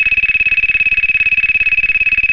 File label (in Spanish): tono